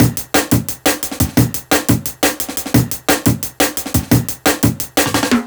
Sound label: classic dnb breaks, C, 175